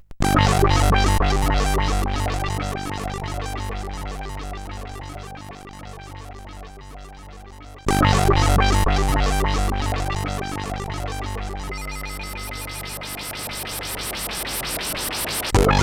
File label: Puls, sid, CSG8580R5